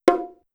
Bongo Lo, Drum Machines, Clavia DDrum AT, Pitched Up; 300 Drum Machines